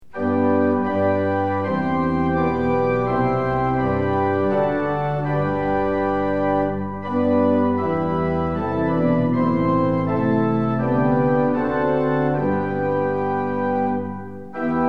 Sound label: tune; hymns; lillenas